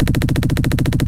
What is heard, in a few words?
loop shvak bass, guns tanks; tnsh